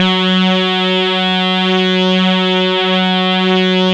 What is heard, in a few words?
SAW RAVE, LEADS